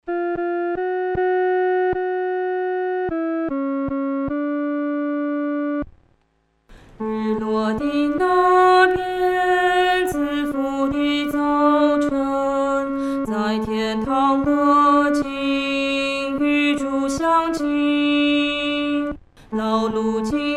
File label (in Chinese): 独唱 第二声